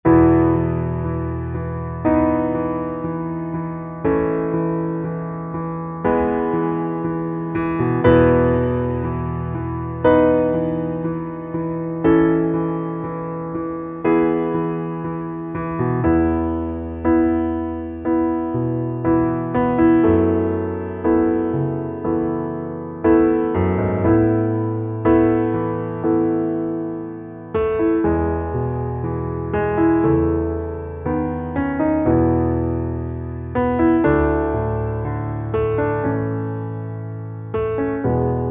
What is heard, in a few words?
Underscore PIANO; Worship Flow